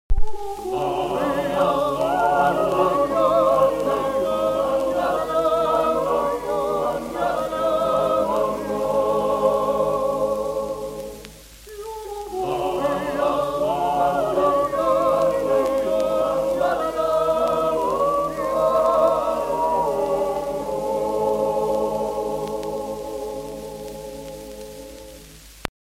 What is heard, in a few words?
swiss yodel; vowel study voice examples vowel samples